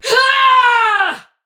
Attack Effort; en; Tau; Tau Dax Ninja; Battle Barks

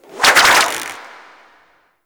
Kumihimo String Whip; Warframe; Koumei

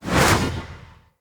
Shield Throw; Weapons; Swords; Dendrit Sword And Board

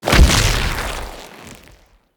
Root Spider Mother Web Shot Proj Burst; Animals; Spiders; Abilities

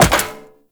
Wall Jump Metal Perforated; Footsteps; WallRun; Warframe; Characters; Tenno

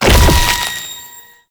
Infested Lich Summon Tentacles Emerge; Warframe; Abilities; Zeke

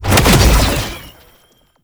Web Stomp Cast; Boss; Enemies; Abilities; Warframe; Oraxia

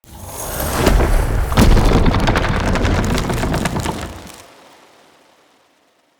Polymorph Tree Cast; Enemy; Abilities; Characters; Mendicant; Mnd Wazard

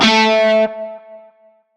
Shawzin Oct Two A; Music; Warframe; Narmer Shawzin

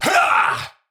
Attack Effort, Battle; Warframe; Tau; Dax; Knight

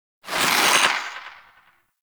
Protea Fan Shrapnel Projectile; Warframe

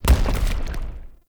Heavy Melee Charge Step

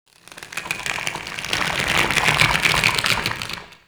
Corpus Infested Tendon Stretch; Warframe; Ambience

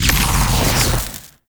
Flame Thrower Shot; Grineer; Drill Sgt; Weapons

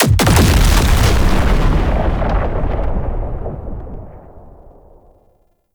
Dropship Explosion; Warframe; Tau; Prime Grineer

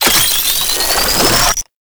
Pistol Alt Fire Charge; Weapons; Scaldra

